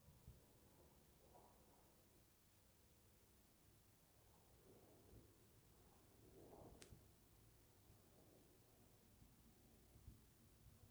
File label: Soprano Pipistrelle